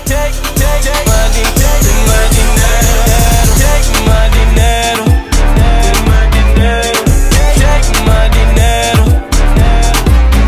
alien music

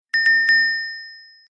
Honor Message Ringtone; huawei

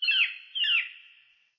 Chirp, ringtone; xiaomi

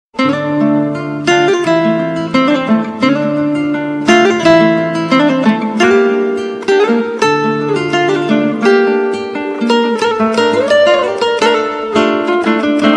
super guitar RINGTONE

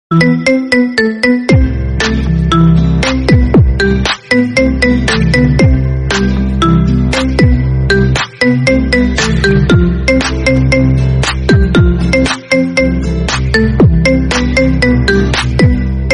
iphone ringtone; apple

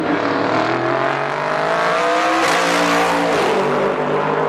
nissan gtr gt1 acc

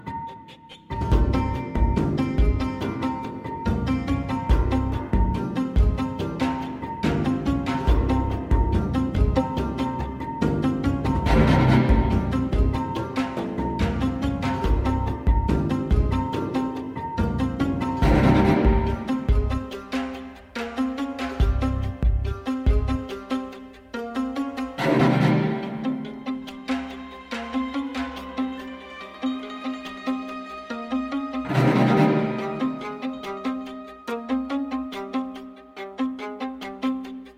background music

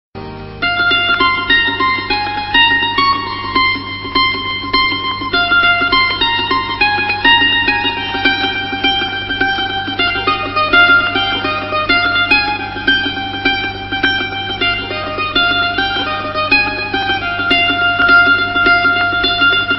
indian romantic

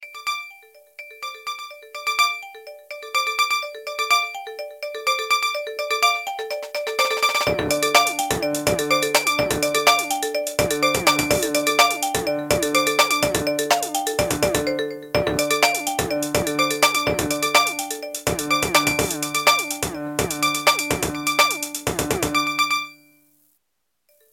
nokia futuristico

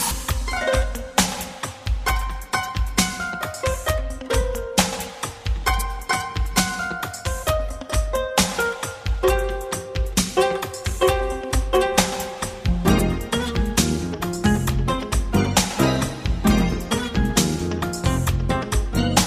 music

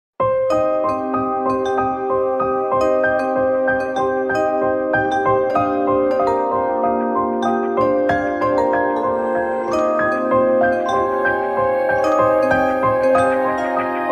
best apple ringtone

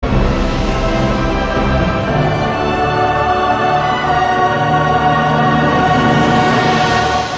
ringtone; jurassic world

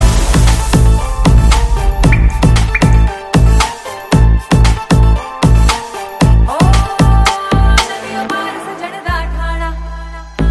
hindi ringtone

